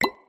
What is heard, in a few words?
button click; sweet shuffle